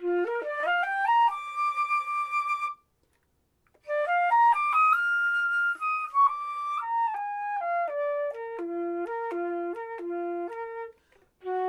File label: flute